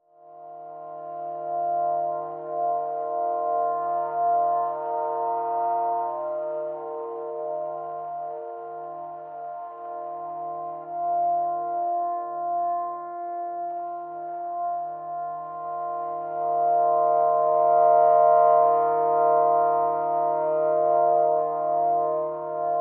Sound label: No Vocals